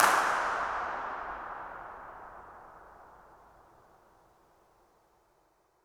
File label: incinerator dirac